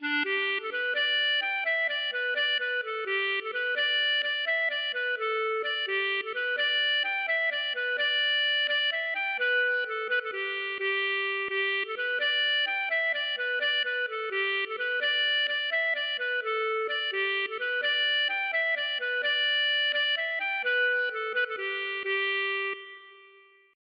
valse Ecossaise

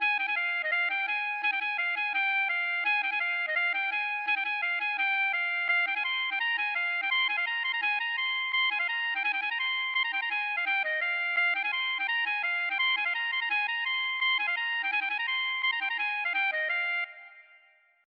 gavotte; Gavotte Pourlet de Plourae

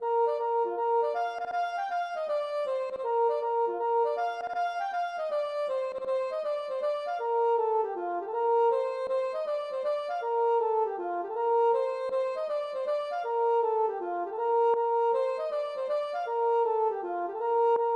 gavotte; Gavotte pourlet No8

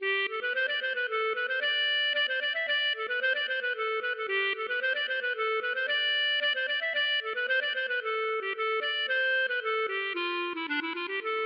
scottish Correzienne

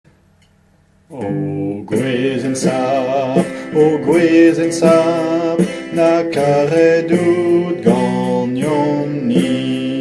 chant Breton; Chant breton : O gwezenn sapr

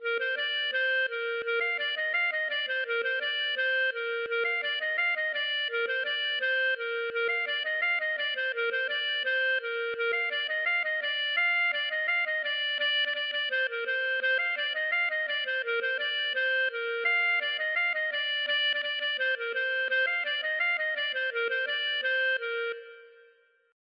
laride

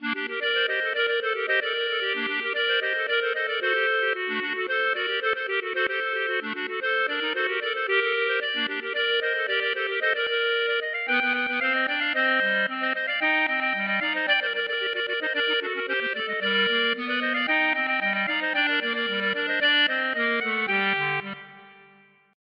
klezmer 3 voix

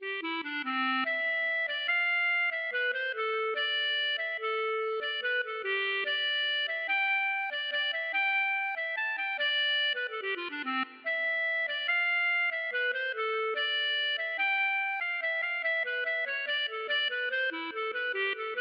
classique, Concerto Piano; Tchaikovsky

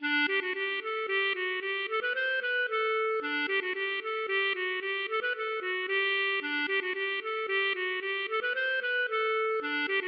bourree; Massif Central

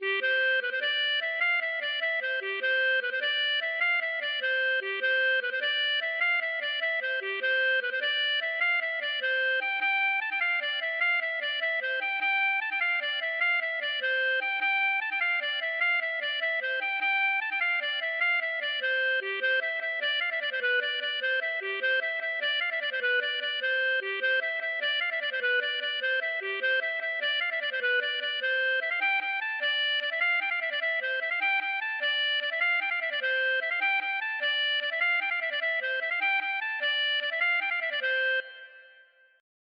bourree Du Perigord

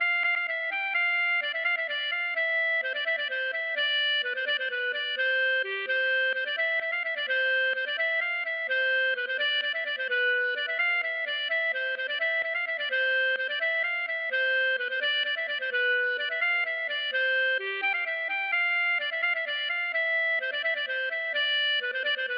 bourree; Croisee De Glux

